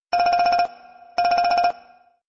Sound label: Cool Ring